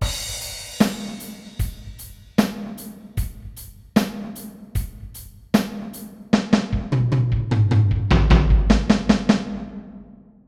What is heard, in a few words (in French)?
rythme